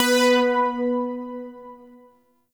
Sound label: Shawzin Oct Two B; Music; Warframe; Zariman